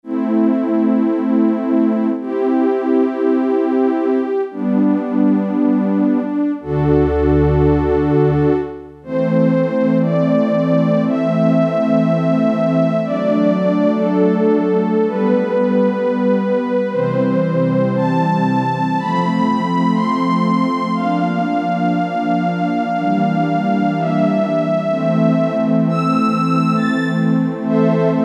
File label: SYNTH STRINGS, Yamaha PSR-300; Voices